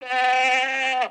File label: Pet Goat Bah; creatures pets